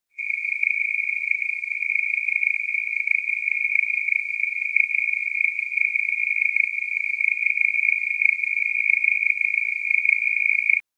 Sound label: insect; q3rally